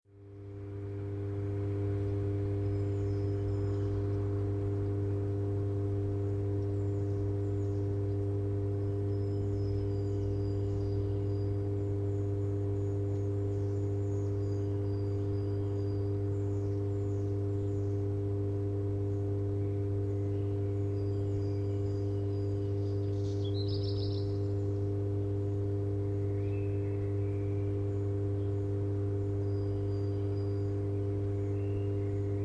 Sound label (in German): Brummender Trafokasten und singender Hausrotschwanz; in der Leyendeckerstrasse